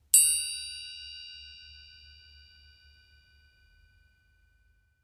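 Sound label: Elephant Bell Small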